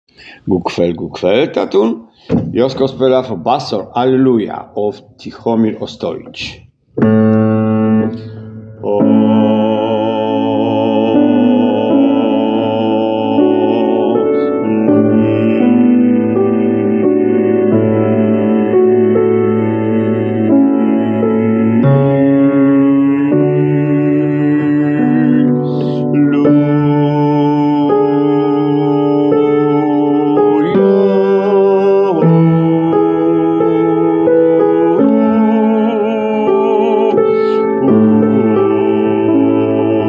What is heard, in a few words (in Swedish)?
bas